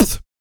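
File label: vox percussion, Vocal Planet Jazz FX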